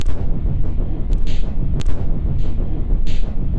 rough ambient; LOOPING AMBIENCE

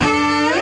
orchestra string hit D

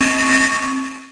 lofi sega sample; Lofi Sega Samples

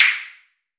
pop slap, snares; single hits

